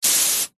garden hose spray water jet on window through fly screen; household